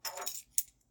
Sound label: pickup key